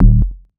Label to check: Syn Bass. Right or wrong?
Right